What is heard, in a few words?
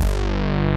SYN SAW BASS; Pro-Rec - Synthsonic; BASSES